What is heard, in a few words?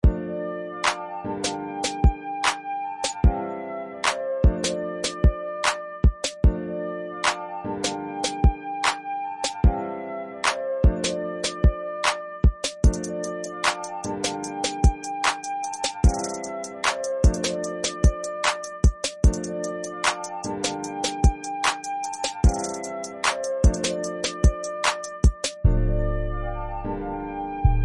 instrumental